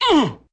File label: Vocal uh